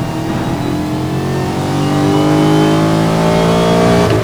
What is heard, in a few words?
second, vehicles